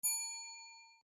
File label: first reminder